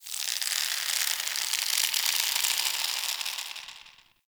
Under City Rope Creak

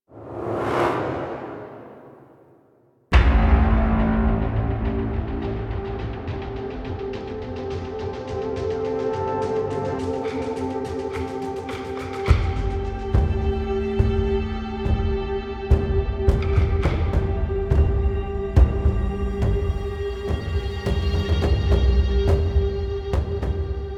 Amb Cue; Warframe; DE; Hubs; Syndicate Steel Meridian; Tenno

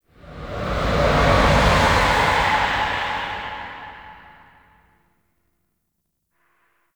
Queens Mind Burrow Enter; The War Within

